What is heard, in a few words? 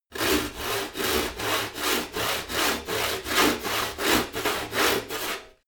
sawing